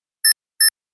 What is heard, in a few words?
Laser